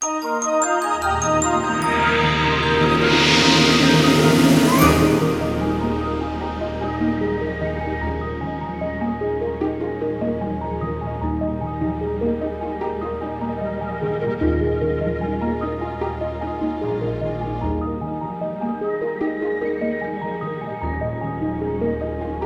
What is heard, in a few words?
Ident